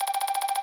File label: count Up; butterfly staxx 2